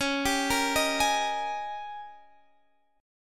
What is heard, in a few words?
G sharp Major 7th Suspended 2nd Suspended 4th C sharp